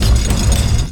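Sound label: Se Dungeon Door; etrian odyssey ii hd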